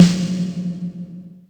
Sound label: Amb, Yamaha DTXpress Acoustic Snare